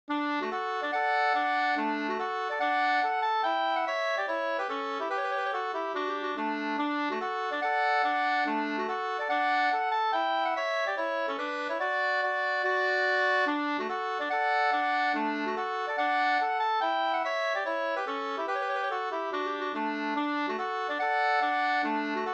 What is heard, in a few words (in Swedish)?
schottis; Tierpssschottis av Olof Jansson Up 2st